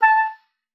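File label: A5, oboe d'amore; Xsample VOL-7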